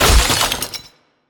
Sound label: Menu Crack